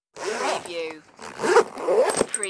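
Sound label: Zip Close Luggage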